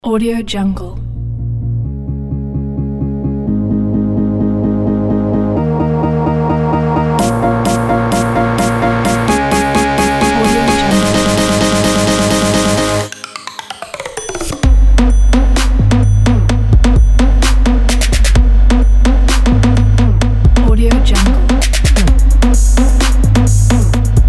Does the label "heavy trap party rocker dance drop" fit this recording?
Yes